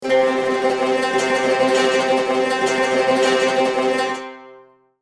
Lute tremolo long

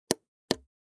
Long click

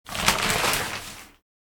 Shower curtain sliding open

